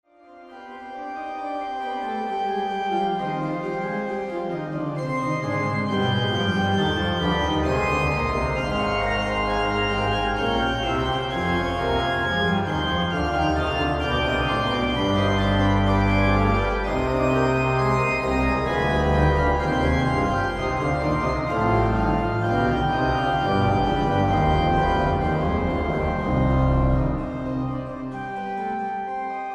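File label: orgue; Johann Sebastian Bach; Passacaglia und Fuge c moll BWV 582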